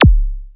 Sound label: 909-Style drum hits; FM 57 - The Ultimate Sample Collection (1997)